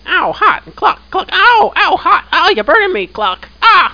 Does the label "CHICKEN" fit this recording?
Yes